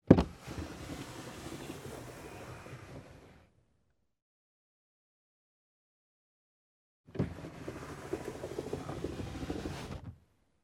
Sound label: Car glass lowered, raised manually